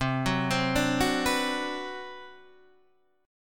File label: D 13th C